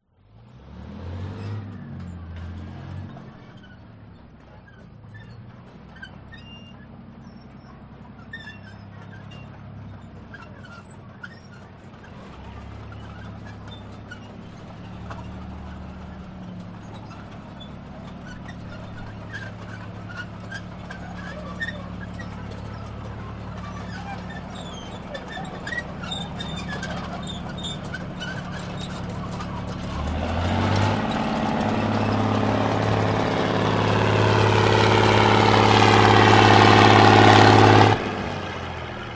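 warfare tank matilda mark 2 1939 park up slowly approach pass park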